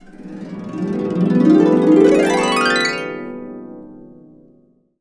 B CASCADE UP, Harps; Complete Harps; USB Soundscan vol.62; AKAI

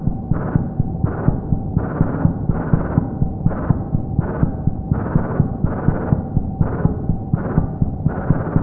NOISES; Zero-G Ambient 1; MACHINEDRON2

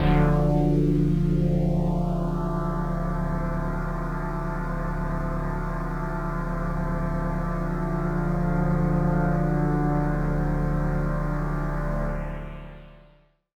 One Shot Drone D, dystopian drone; One-Shot Drones

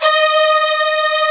D#3, VIOLIN SECT; AKAI S6000 CD-ROM - Volume 1; Strings

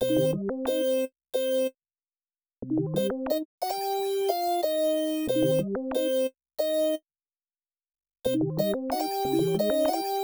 Melody

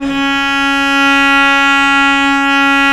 VL C#3, VIOLE, Historical Instruments; USB Soundscan vol.23

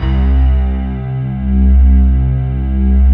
MUTE PNOS, JV PADS; Pro-Rec - Synthsonic

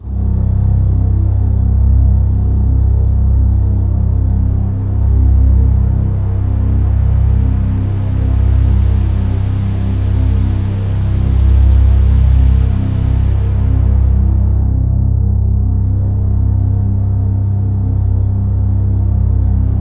C1, Synth Line; 90 s Sample CDs; NiceBeats; SynthLine VOL-15

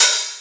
Open Hihat

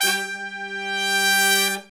BRS LONG, R&R Horns, Sect; Roland L-CDX-03

